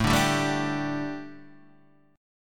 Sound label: A 7th Suspended 4th, chord